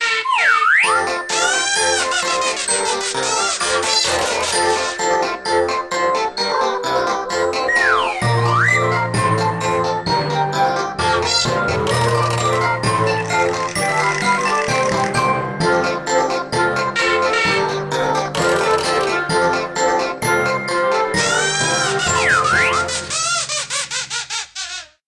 Cartoon Character; Pantech; Discover P9090; alarms

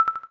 Xylophone

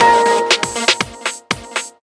Big Bell; Pantech; Perception

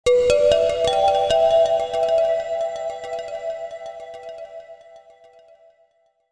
lounge jingle; Sunrise

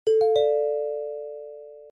message; Verykool; S700

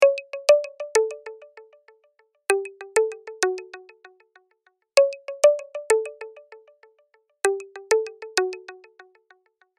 Ring Neutral Gems; Alcatel OT-3088X; ringtones

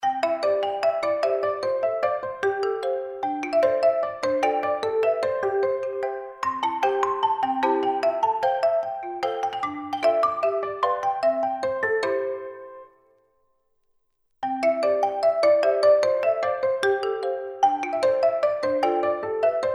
Traditional vibe, ringtones; Vsmart; Star 4

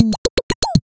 High Blown, notifications; Meitu; M2

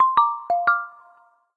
Xylophone, notifications; Honor; MagicOS 7.1

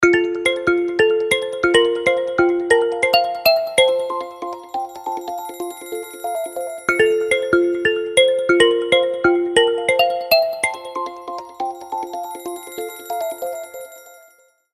Thumb Kalimba; Itel; Power 80

